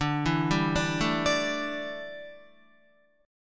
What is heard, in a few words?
D Suspended 2nd Suspended 4th D down Guitar; arpeggiated